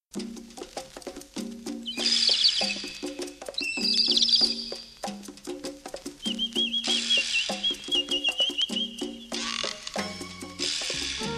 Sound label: JUNGLE DRUMS